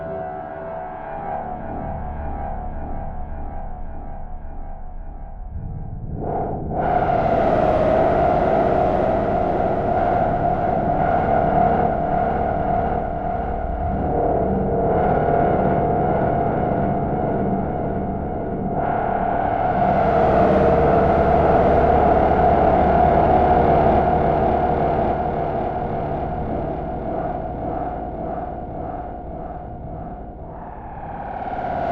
Intensity Layer, Music

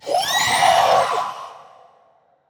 BABY MOLOCH damage

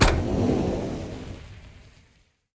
Reactor Temperature Boost Up

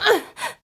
female damage

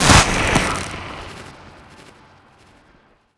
projectile; Jove

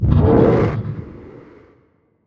ENDWORM damage

